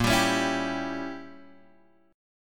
Bb Diminished 7th Bb Guitar Drop D; Bbdim7 strummed

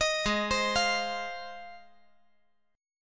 G sharp 6th; arpeggiated; 20,20,20,20; 5G,4C,5E,5A; JGuitar

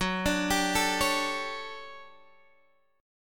D Major 11th F sharp, chord

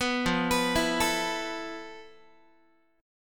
F Major 7th Flat 5th Guitar; arpeggiated

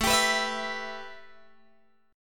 A 7th Suspended 2nd, A, down, Guitar, Open G

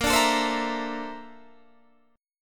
A sharp Major Sharp 11th, Guitar, Standard; 18,17,19,17,17,18; strummed; capo=9

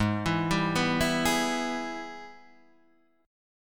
Ab Minor Major 7th Sharp 5th

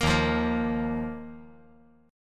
D sharp Suspended 2nd D sharp; strummed; JGuitar

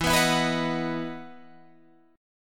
E Major 7th Suspended 2nd E down Guitar Drop D

